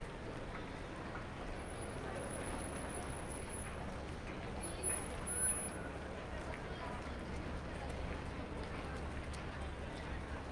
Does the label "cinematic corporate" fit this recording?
No